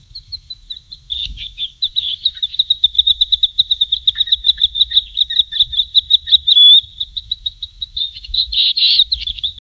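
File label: sweet and cuddling cheetah; animal vocalizations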